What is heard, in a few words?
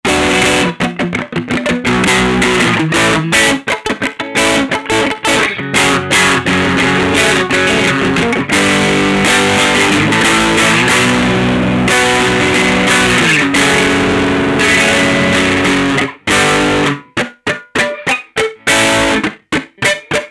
WAH FUZZ